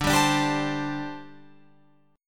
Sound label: D 7th Suspended 4th, down, Guitar, Open F